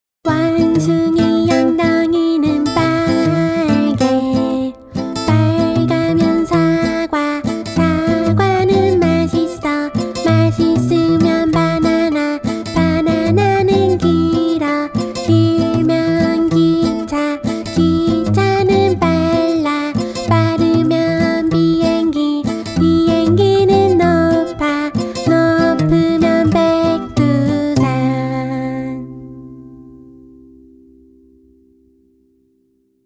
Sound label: Monkey's Bottom (Korean)